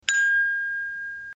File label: ding